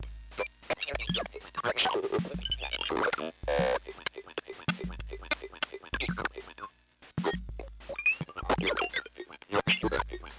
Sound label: speak and spell